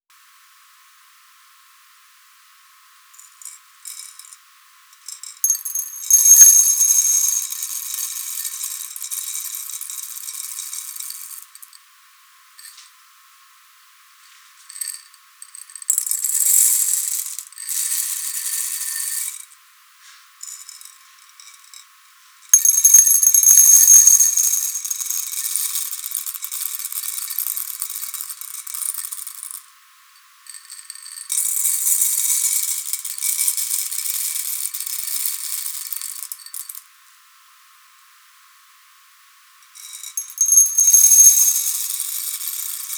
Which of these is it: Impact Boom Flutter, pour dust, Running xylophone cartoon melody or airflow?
pour dust